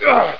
pal pain, player; Hexen2

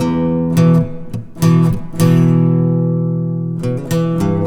Acoustic, Guitars; ACID MUSIC

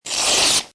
scritch; MOBICLIC155

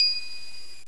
Triangle; Indiana Jones Demo; iMuse

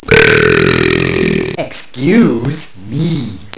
burp

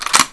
COCK GUN

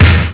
drop; Softdisk for Windows 46; POLYDRAX

